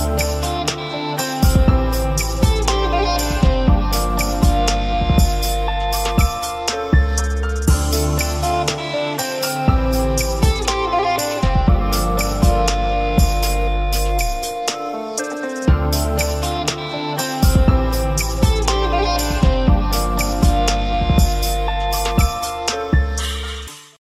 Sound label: instrumental